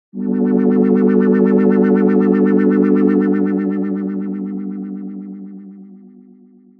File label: Trance; jup8